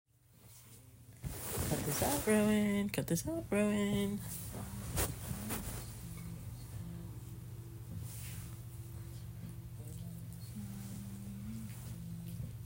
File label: Sleepy gorl Whimpering Wet noises; F4M